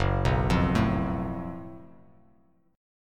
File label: Gb Minor Major 7th Double Flat 5th, Bass Standard; arpeggiated; 2,2,3,2